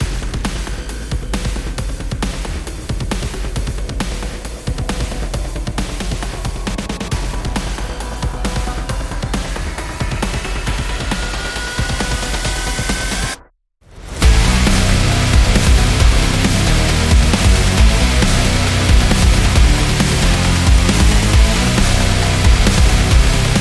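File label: Weapon Showcase, sport and action music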